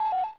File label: friend beep